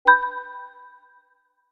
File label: Ping Notification